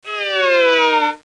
Slide

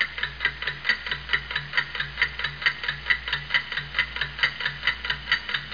Clock Ticking Short; Museum of Anything Goes

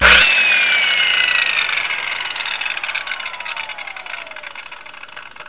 saw

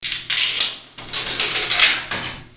Coin Drop; Florida State Football - Ultimate Multimedia Experience; jukebox

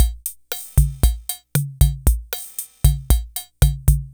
116 TR77 AFRO; maudio; Electro Crash

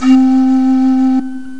Pan Flute; Pipe; GMPlay

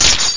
crack

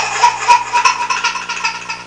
baby choking; People

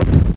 Def HG Shoot

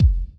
Elec Kick

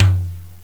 Jazz Tom; GMPlay; Drums; TG300B

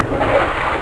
water; Fishing Flash Cards

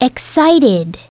excited; WordBank

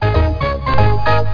win; taxi

